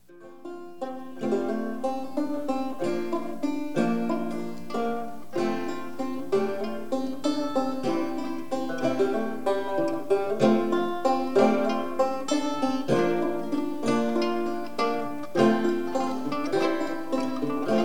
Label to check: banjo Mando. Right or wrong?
Right